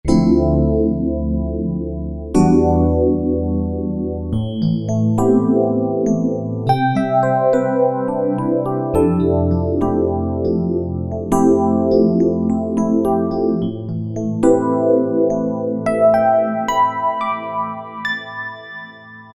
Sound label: Hyper Tines, Yamaha PSR-3000 E. Piano; Factory Voices